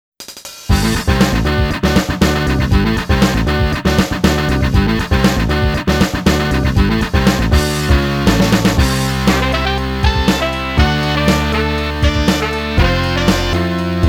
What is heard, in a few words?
Stormy Saxophone; the king of fighters 2002